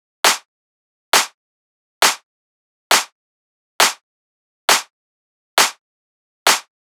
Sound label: Clap Loop 135, Techno